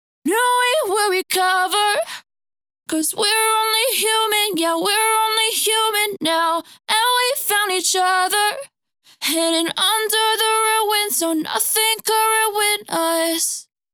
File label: Vocal Dry